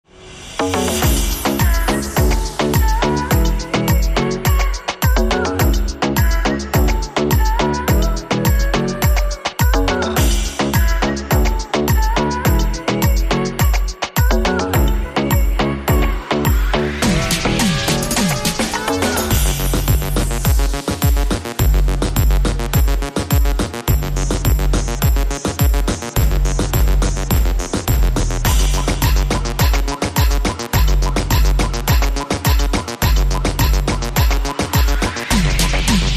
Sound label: Kingston Dancehall